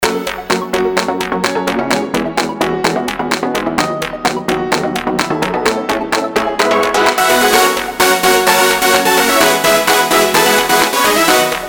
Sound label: TwoStep